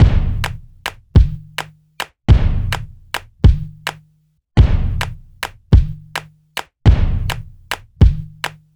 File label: 105 Breaks; She Said